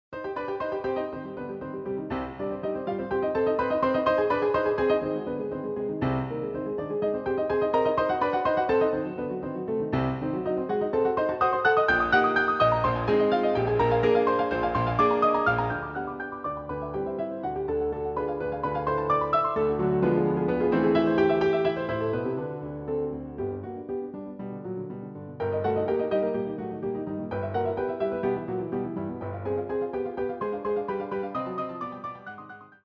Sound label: Piano Sonata; Opus 21 3rd Movement Allegro; Chaminade